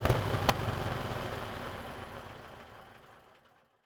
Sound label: engines; World of Tanks